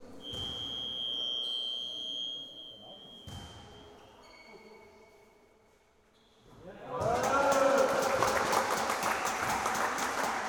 Masculine U-18 Match, Basketball Match Ambience; Sonic Bat

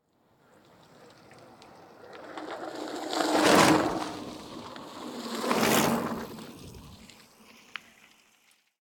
Mountain Bikes x2 Passby Dirt Fast; Bicycles

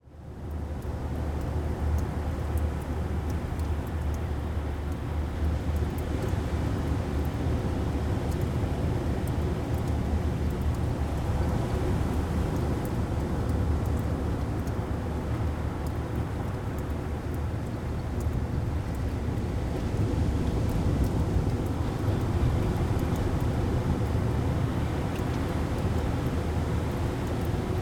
Ocean Cave Resonant Hole Wet Distant Waves; Ocean Ambience; Sound Spark LLC; Caves, Crevices and Waves

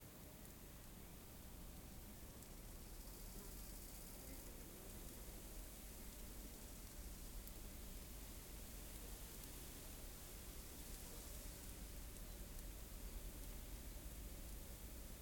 Field Wheat Dry Sizzle Insects Wind Soft; Systematic Sound; General Ambience Series; Rural Countryside 01

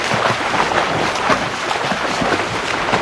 splash; hl2; ichthyosaur

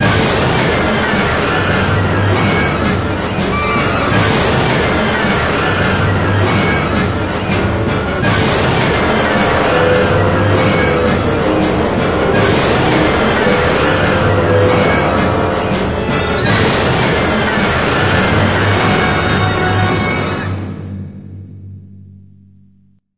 stinger; hl2

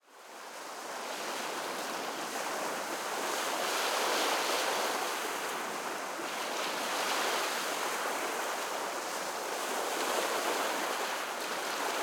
Lake Huron Mackinaw Evening, Water Ambiences; Soundopolis

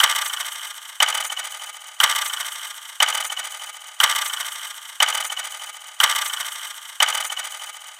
Clock, Effective Trailer Clocks; Federico Soler Fernández

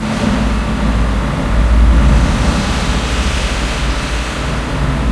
cargo hold, borealis ambient areas; hl2

